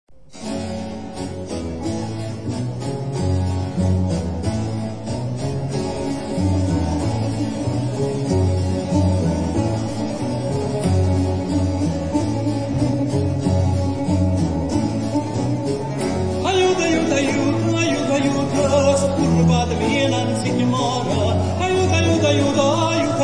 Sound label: tarantelle